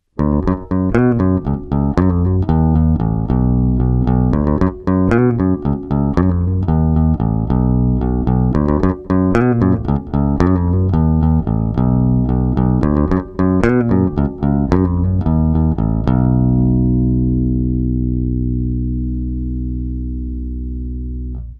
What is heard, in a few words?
room bright